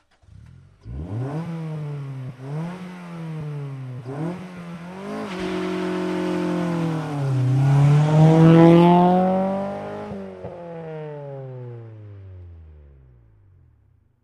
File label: Integra Strt Rev Tire, acura integra ext.